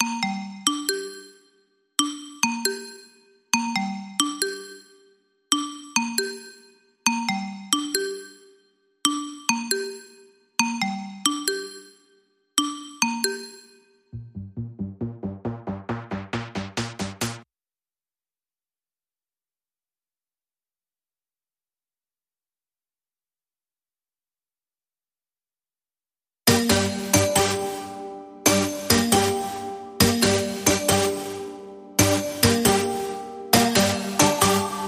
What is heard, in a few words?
Bells Synth